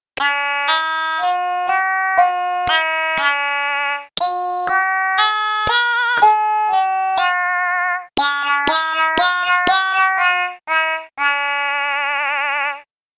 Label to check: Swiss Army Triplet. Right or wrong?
Wrong